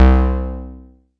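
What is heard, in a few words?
mbrute synth, Arturia MiniBrute; DRUM MACHINES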